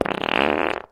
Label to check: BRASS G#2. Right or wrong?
Wrong